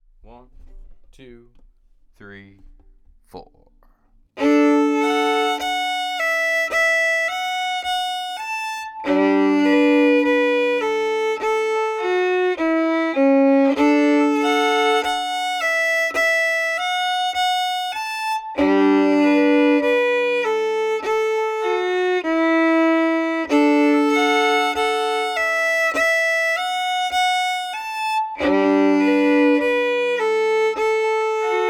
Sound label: prayerful hymn in d plain, fiddle